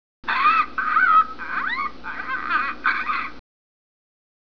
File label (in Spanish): Loro